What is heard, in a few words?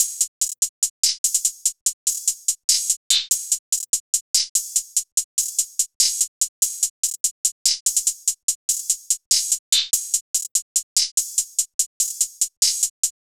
Hihat Loop 145 BPM; Potion; Cymatics; Fiji Hihat Loops & Percussion